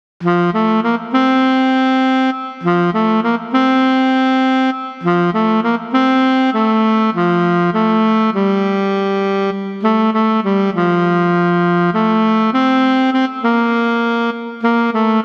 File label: When The Saints Alto Sax; Duets Clarinet Saxophone